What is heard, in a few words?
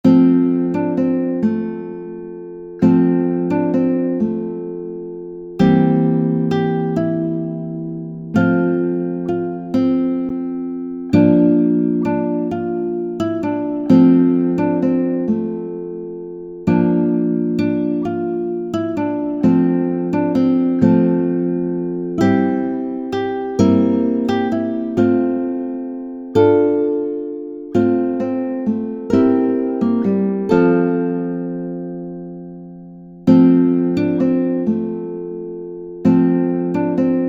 guitar, F; Stille Nacht